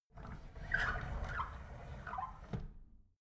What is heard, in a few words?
drawer big squeak close soft